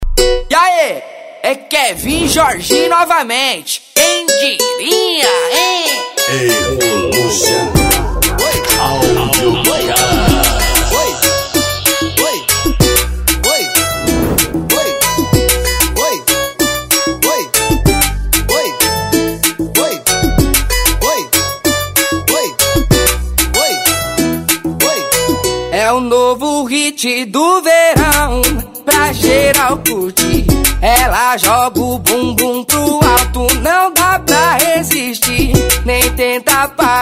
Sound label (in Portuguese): especial funk